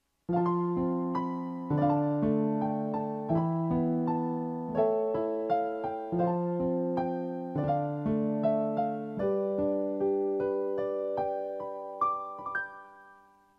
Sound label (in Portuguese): Piano Bom Natal